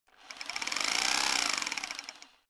rock drill chisel short fast; maschinen; schrauben drehen hydraulik

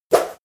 whoosh short tonal; swooshes-swishes

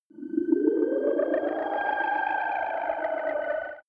far fighting racer, raumschiff

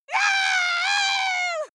female lunatic scream attack high pitch; menschen; schreie

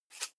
herbs shake dull single; soundpool

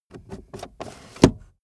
car accessories cup holder cover close slow; autos; verkehr transport

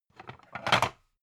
pan take

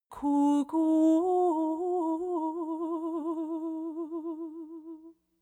woman sing sequence vibrato

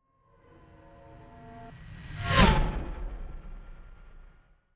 fly by hover car hum high

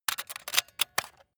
metal small spring handling fast; industrie wirtschaft; metall

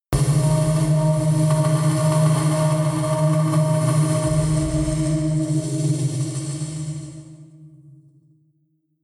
noise impact shimmer; hits-explosionen

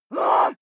female shout upset short; menschen

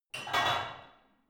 barbell only replace reverberant hard; sport freizeit; fitnessstudio

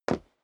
step sneaker wood parquet single stair up; schritte

